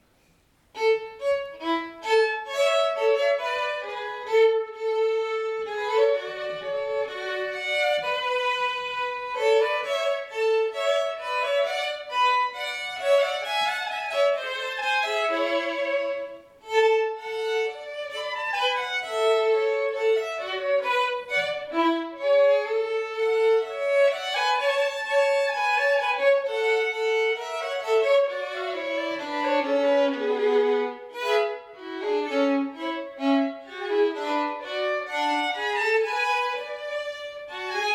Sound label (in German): Gavotte 2 Violinen; Schostakowitsch